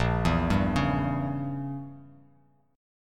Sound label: A sharp Diminished 7th